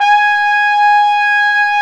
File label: WND FLG SO, Hybrid Winds Flugel & Sop